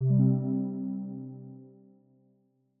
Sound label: point, click; RelaSphere